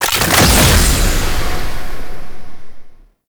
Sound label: Safe Zone Item Pickup; Gameplay; Warframe; Nineteeen Ninety Nine; Deep Archimedia